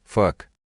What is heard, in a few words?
song; skibidi trash